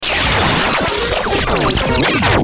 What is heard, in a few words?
Stinger Radio Warble Sweep; Stingers